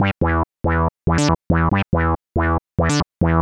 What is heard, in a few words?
Moog Buzz 140; Audacity; loops